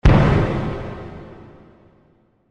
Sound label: Oil Drum Explosion Impact Concussion Metallic, Lid Blown Off; Explosions; Blast